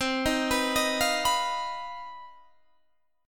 C Minor Major 7th Double Flat 5th C down Guitar Standard; arpeggiated; JGuitar